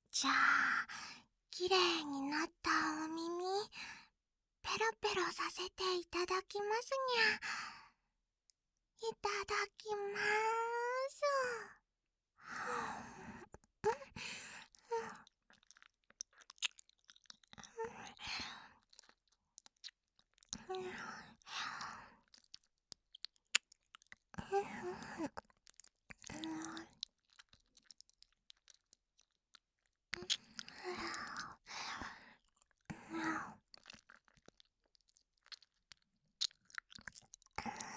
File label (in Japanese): 耳を舐め舐めするにゃん